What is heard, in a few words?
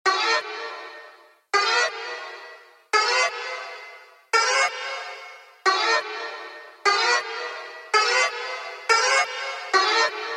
Annoying Accordion